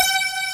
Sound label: SQUARE, FAIRLGHT; East West; Global Sounds